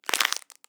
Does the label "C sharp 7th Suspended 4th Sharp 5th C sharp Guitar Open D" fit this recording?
No